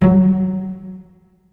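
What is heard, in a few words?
cello pizzicato F#2, Virtuoso Strings; ILIO; Kirk Hunter Virtuoso Strings VOL-3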